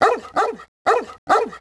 dog; Lego My Style Kindergarden macOS Windows 2000

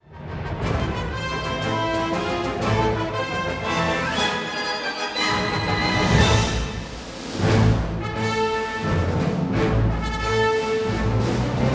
Battles Shared Imperial Win Loop

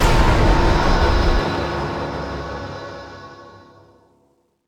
Sting; Greed

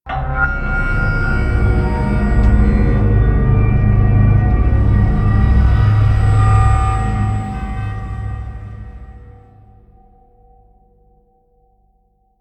UI Stinger Complete; starcraft 2 wings of liberty

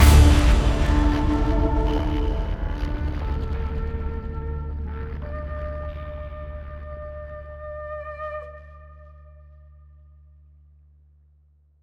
Psycho Stinger; Stiltz

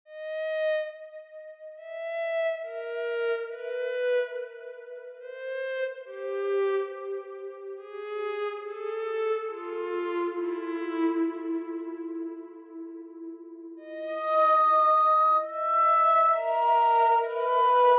Temple Undead; inscryption

